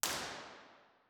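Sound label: impulse response, nuclear reactor hall; b-format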